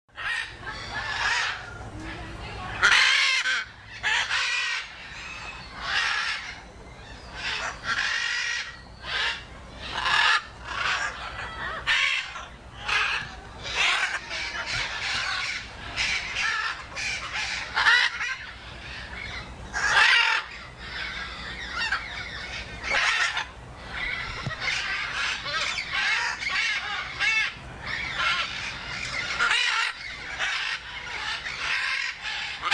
zvuk popugaya ary kakie zvuki izdayot popugaj ara

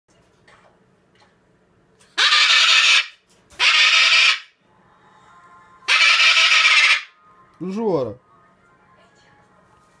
molukkskii kakadu razvlekaemsya

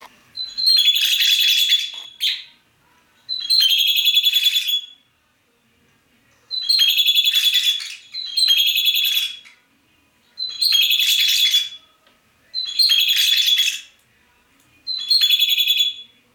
cibrik pevcii popugai, parrots psephotus haematonotus

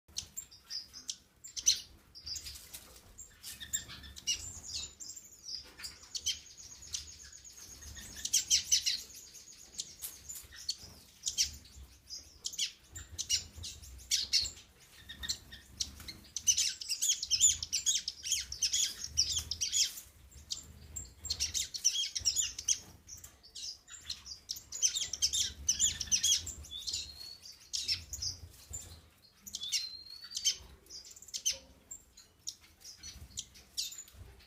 oranzevoshhekii astrild estrilda melpoda

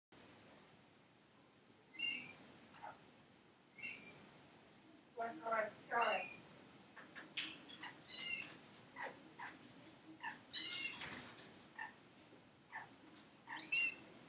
popugai rucnoi i laskovyi traurnyi kakadu, parrots calyptorhynchus banksii; butik

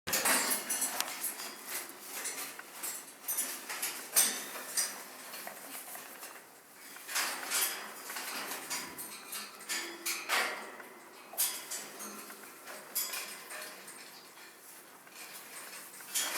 molukkskii kakadu cacatua moluccensis rucnye ptency; iz pitomnika

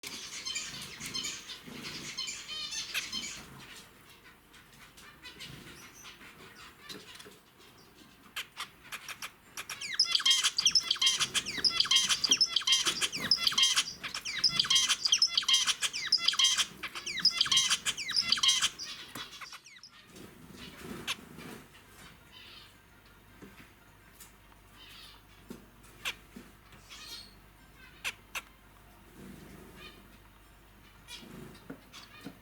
pesnya zebrovoi amadiny; amadina

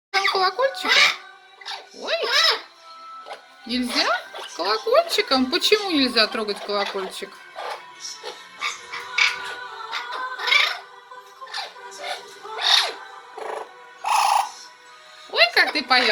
popugai amazon venesuelskii popugai, parrots, amazona amazonica

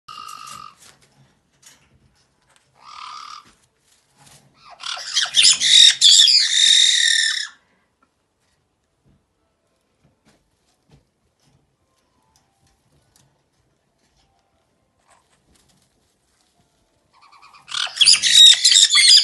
kak kricat popugai kaiki, parrots, pionitis

